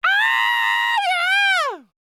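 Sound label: falsetto, R&B Dance; Spectrasonics Vocal Planet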